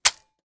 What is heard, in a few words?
mech, 40mm; gmod; arccw uc commons attachments